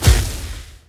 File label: beast lava ball rise